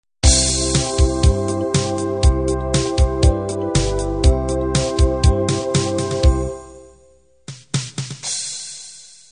8bt analog intro

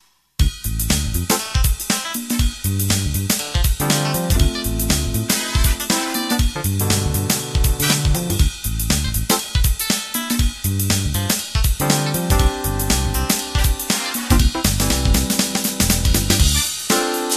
Jazz Funk

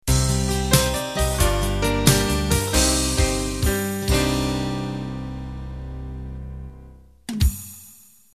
12-8 Ballad End

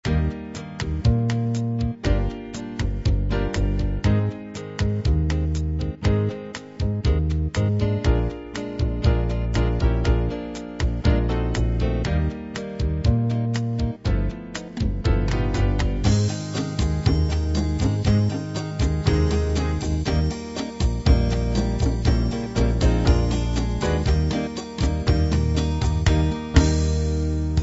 bossa

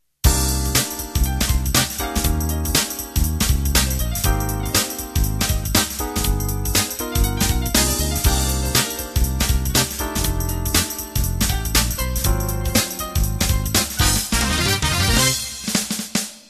Groove Funk Intro

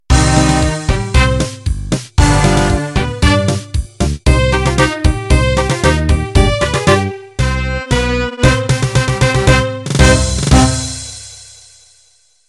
Pasodoble End; Ending #2

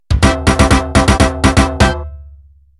Batucada End

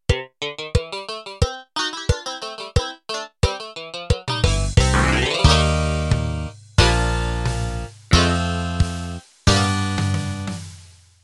Newgrass Intro

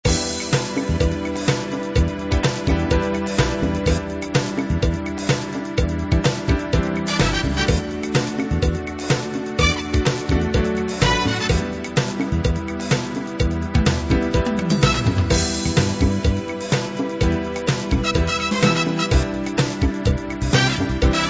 latin pop

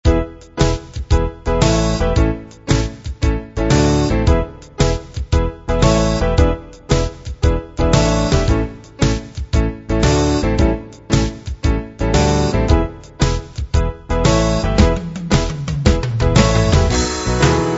blues memphis

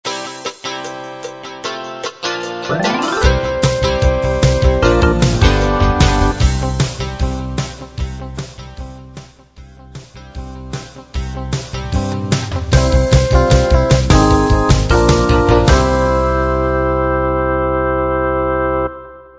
XOver excerpt

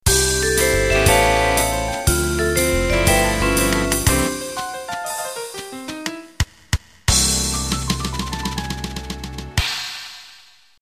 Cool Jazz End

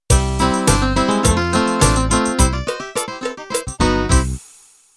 Country 2 Step End

